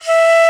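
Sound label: soprano bansuri, World Winds; E-MU Producer Series Vol. 7 – Old World Instruments; World Combos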